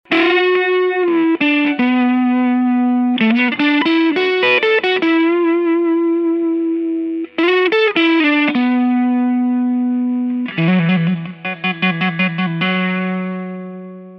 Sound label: Overdrive